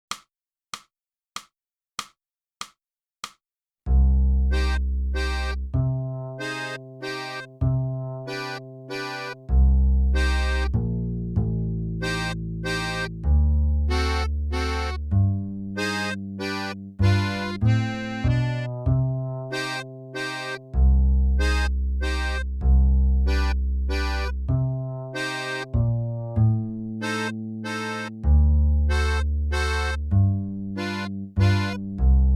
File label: sax